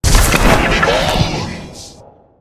rattle me bones, magic

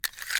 component, pickup; Aurora.3

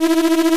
owl; chatter

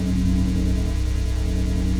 generator mid

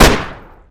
Gunshot; weapons

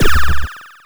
PSHOOM

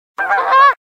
goose

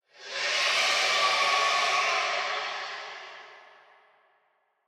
screech far, alien voice

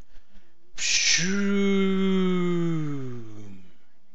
PSHOOM

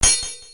parry, weapons

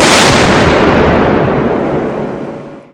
blast cannon, weapons

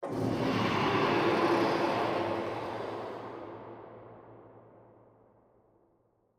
roar far, alien voice

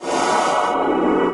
curse attack

